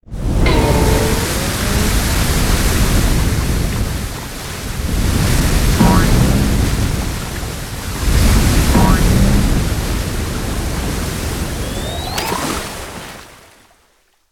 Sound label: geyser; Poodletooth-iLand; Panda3D